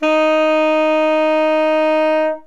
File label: sax; Winds